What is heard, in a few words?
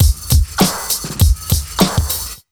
100 Beat, Underground Hip Hop